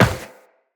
Run Metal, Footsteps; Tenno

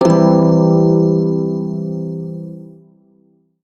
Shawzin Phrygian Chord; Warframe; Prime Shawzin

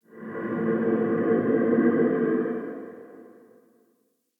Tree Gnarled Oak Moan; Trees

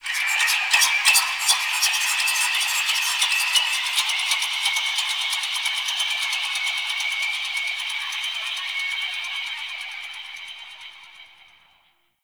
Possessed Texture; Ambience; Warframe; Shadowgrapher

